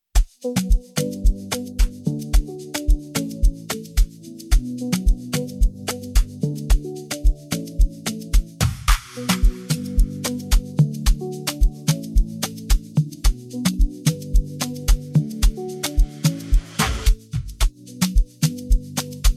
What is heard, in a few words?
Honey Lemon INSTRUMENTAL